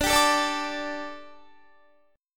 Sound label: D Suspended 4th D, down, Guitar; Dsus4; strummed